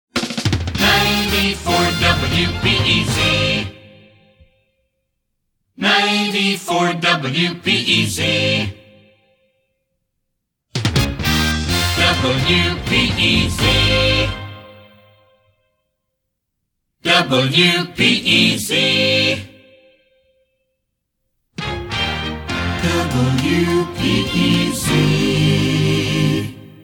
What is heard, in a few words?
Jingles; WPEZ